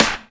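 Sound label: deep house clap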